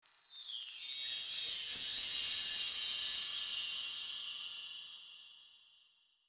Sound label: Mystical fairy chimes and bells